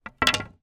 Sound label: pool snooker cue down on table; sport